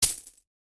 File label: Coins; SNKRX